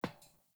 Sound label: Grunt Boot Walk Metal; Sinecure